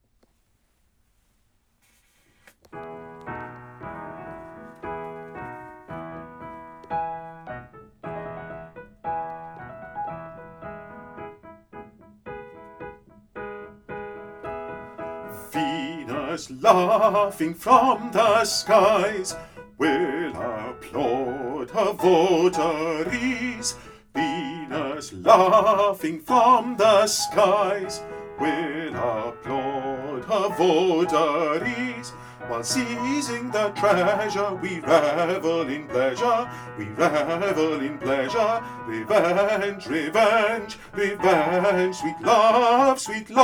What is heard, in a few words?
bass; Venus laughing